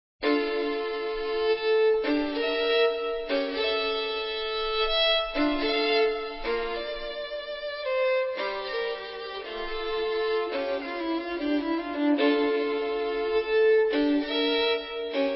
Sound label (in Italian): Ciaccona per violino solo